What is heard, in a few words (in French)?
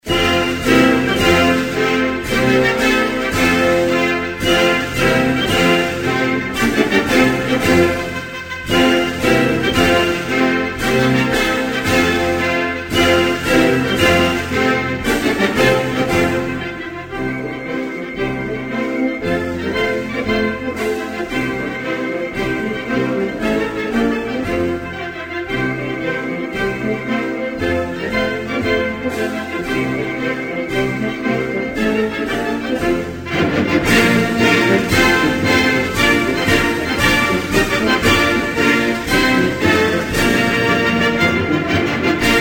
Phillipines, Hymnes nationaux; Philippines